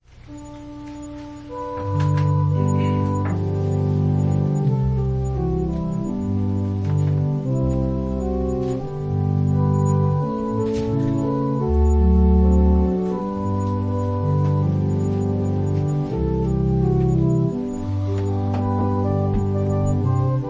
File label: Organ prelude